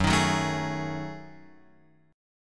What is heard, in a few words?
F sharp Minor 6th Add 9th, Guitar, Open F; strummed; JGuitar